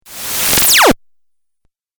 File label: Laser Hiss Video Game